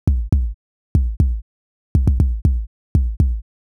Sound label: Kick Power; hydrogen